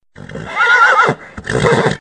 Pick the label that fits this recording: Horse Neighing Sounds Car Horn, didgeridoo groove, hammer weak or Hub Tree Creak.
Horse Neighing Sounds Car Horn